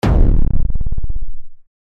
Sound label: distort kick